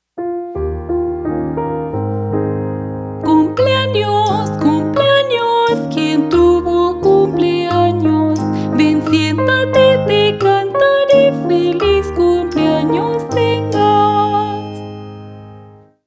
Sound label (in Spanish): Feliz cumpleaños; principiantes 2026